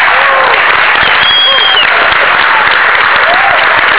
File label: applause; Mars Exploration Rover (Spirit) landing